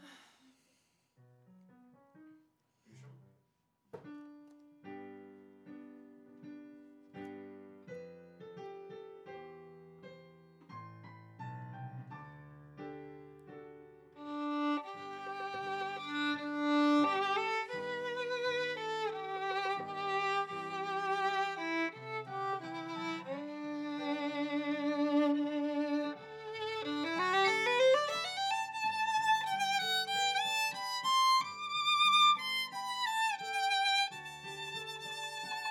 Viola

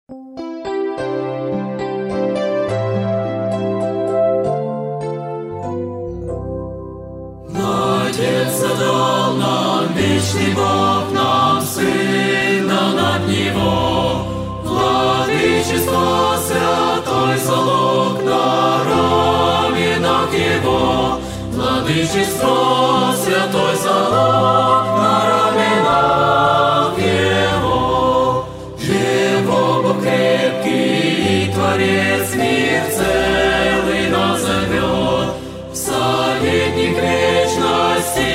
Chorus

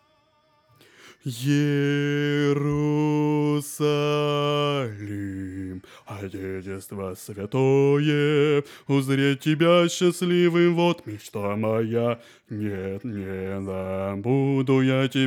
bass

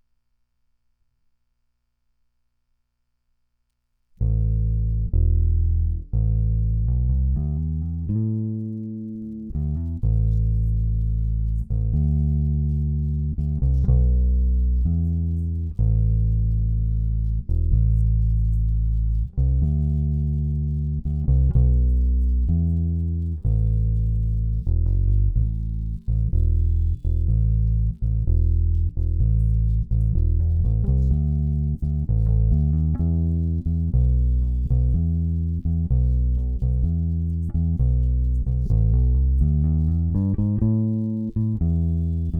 bass

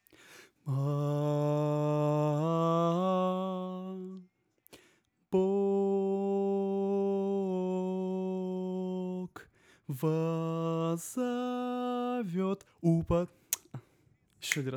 alto

